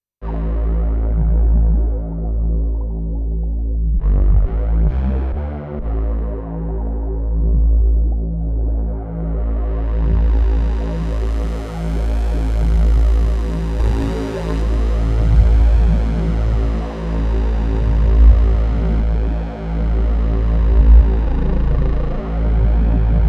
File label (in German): tiefe Frequenzen und Bubbles